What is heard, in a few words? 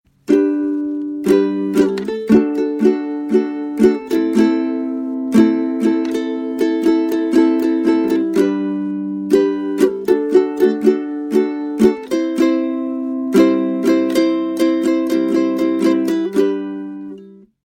ukulele